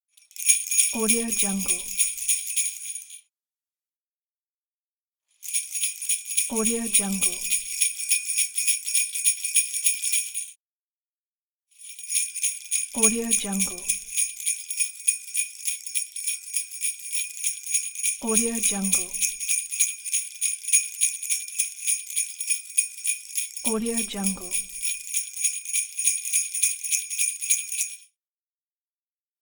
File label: sleigh bells